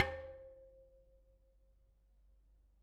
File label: Bicycle Spoke